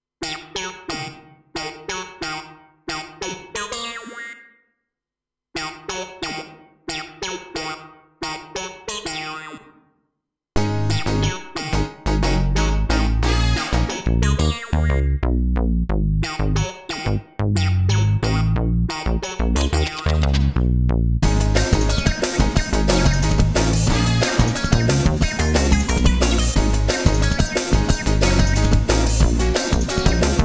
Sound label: Instrumental